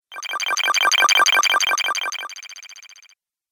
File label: Retro Videogame Fx Strange Motion Bleeps